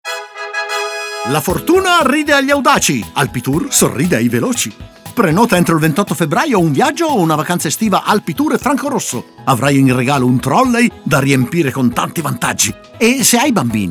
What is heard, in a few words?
Radio Trolley Musica; Alpitour